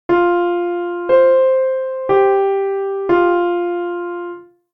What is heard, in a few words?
Hard Melody